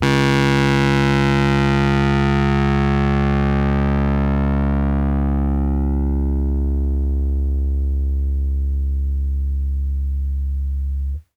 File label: RHODES FUZZ